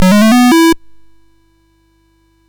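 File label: Mountain King, MOS 6581 SID chip music, games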